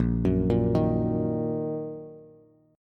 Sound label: A sharp Suspended 4th Sharp 5th A sharp down Bass; arpeggiated; JGuitar